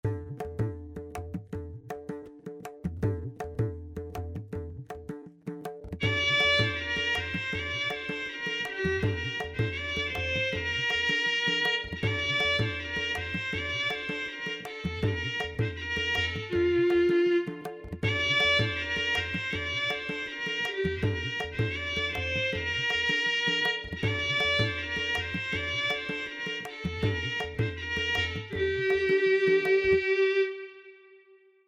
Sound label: Kemenche Legato; Kafi